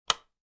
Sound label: switch